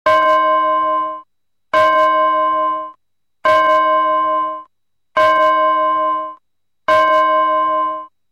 钟声

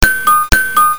门铃

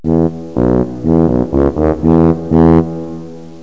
大号

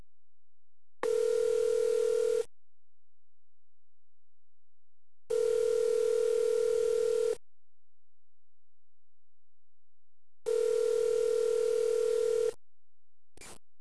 电话等待

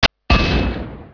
游戏退出; 水果忍者

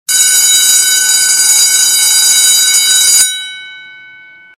学校上下课

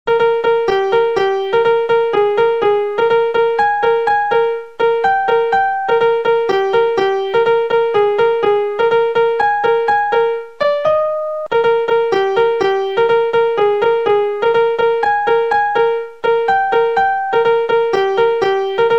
音乐铃声

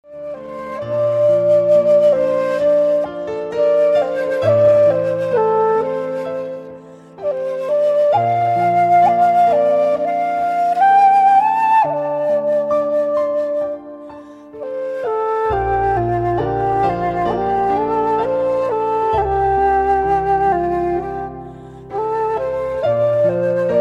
轻音乐; 初中午休开始; 广播室铃声系统

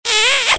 吻好

